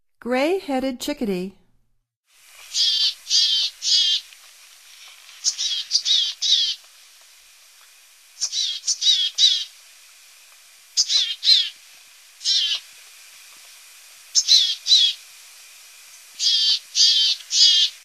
Gray-headed Chickadee, Songs of Birds of North America; Stokes